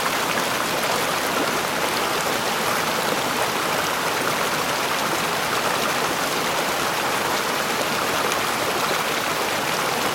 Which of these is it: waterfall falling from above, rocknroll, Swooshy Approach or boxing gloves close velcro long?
waterfall falling from above